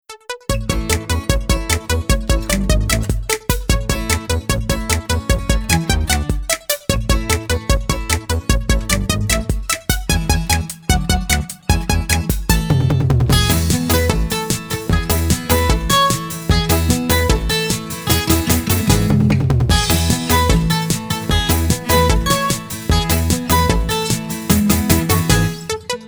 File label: Robot Dance Instrumental